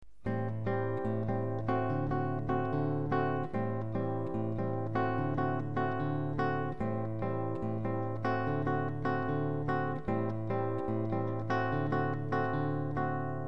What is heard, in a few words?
samba